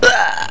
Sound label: death; quake2; postal